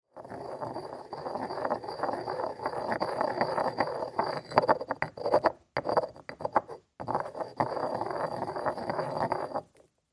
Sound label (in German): Moerser